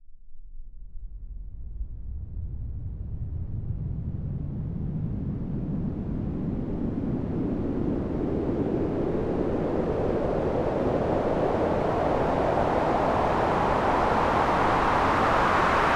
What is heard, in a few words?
Long Sweep, White Noise